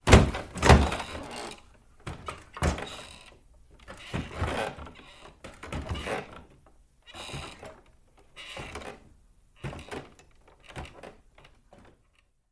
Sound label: saloon door